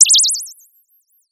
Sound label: Sound of a bird tweeting nicely